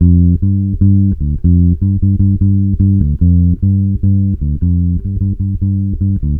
SMOOTH 75, Bass Grooves; USB Soundscan vol.30; AKAI

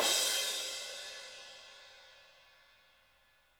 crash, Drum Kits; Sonic Reality

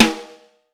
Snare Drum, 5X14; Vintage Snare Drum

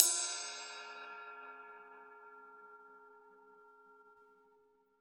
RAW RIDE, Drums Acoustic; USB Soundscan vol.10; AKAI; 90s sample CD

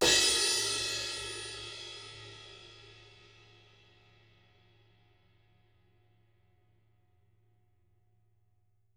crash

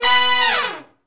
Med Length Falloff (B) unison, Horncraft; Sections; Crimson Blue and Fabulous Horncraft 4 RnB

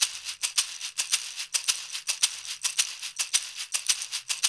108 Udu, Udu Bata

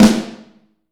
Vintage Snare Drum; Q Up Arts; Johnny.C's Vintage Snare Drum; 7.5X14

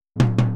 Boogaloo - Tom Rack, 82 BPM; Drums; Intro; Sonic Foundry; Drums from the Big Room The Mixes

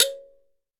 Kalimba C#4; Multisamples; Digital Sound Factory Planet Earth

